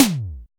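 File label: impact tom HI; POP-ROCK1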